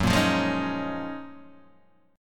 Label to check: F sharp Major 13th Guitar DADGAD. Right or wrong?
Right